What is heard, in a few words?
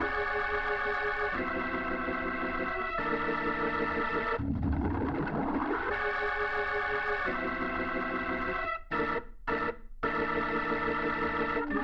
Organ